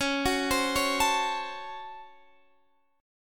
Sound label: Db Major 7th Suspended 4th Sharp 5th, Guitar Standard; 21,21,22,18,22,x; arpeggiated; chord shape